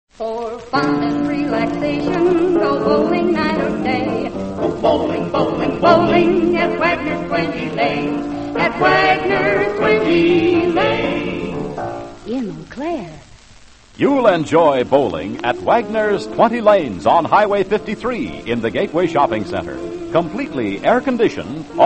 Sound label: radio jingles; wagoner 20 lanes; allied radio artists